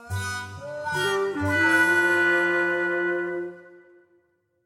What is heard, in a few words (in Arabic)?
إنتقالة كوميدية